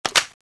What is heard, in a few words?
mp40 clip in